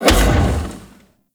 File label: Space Fighter Rocket Explosion; Dredger Rocket Explosion A; Warframe; Vehicles; RailJack; RailJack Enemy Ships; Grineer; Space Fighter Dredger Agent